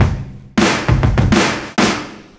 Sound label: PERCS, 70 s Breakbeats